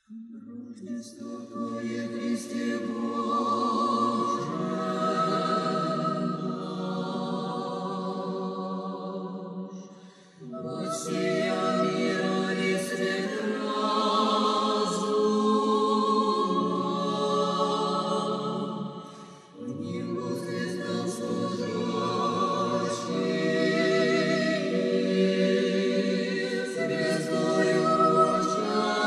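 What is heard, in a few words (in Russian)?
тропарь; rozhdestvu; hor boroveckoy cerkvi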